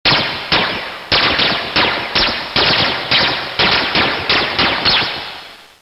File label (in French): Tir à repetition; bruitage; Armes